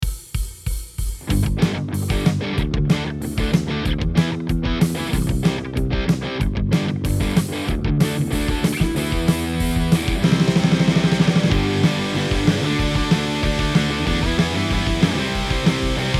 Suhr Punk

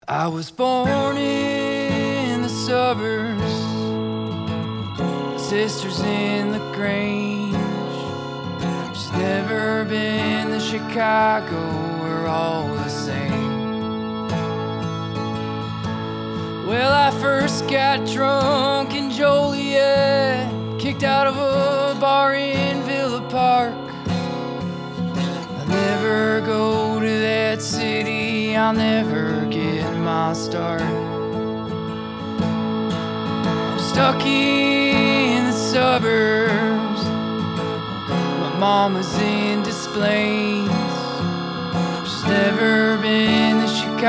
vox and acoustic no verb

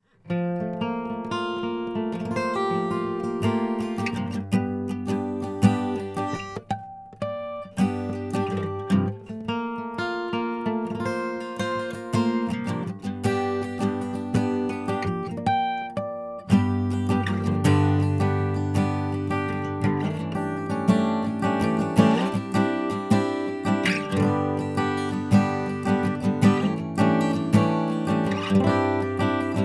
Open Voicings

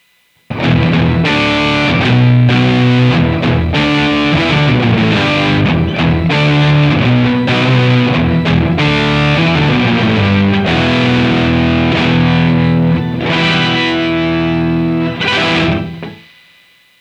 octave dist

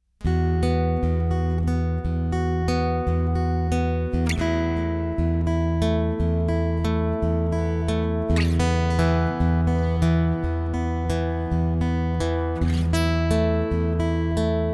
AcoustiVerb HALL

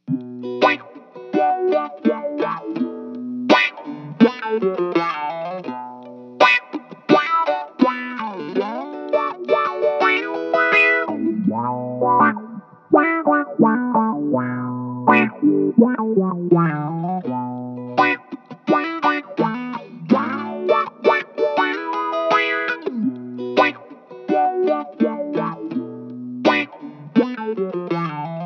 UP FILTER

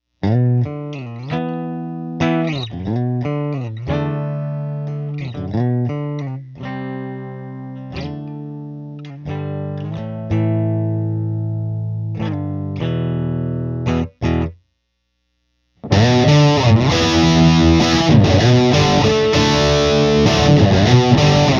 Marshall ShredMaster, Bridge PUP

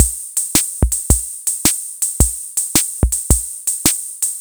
Shuffle

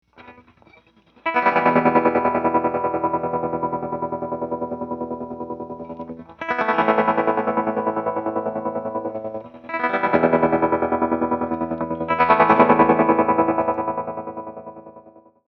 Hummingbird Fast

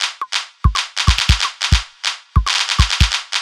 Dry Beat

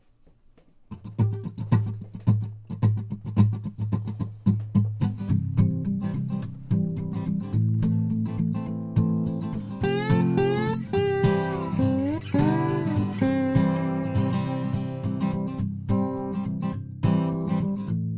Palm Muting with Slide

